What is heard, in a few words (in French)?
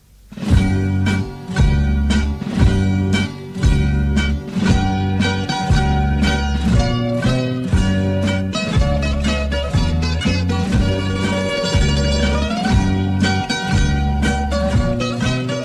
Hassapikos - Grece